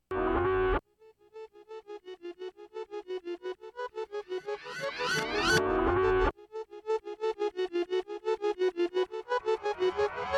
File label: video game gfunk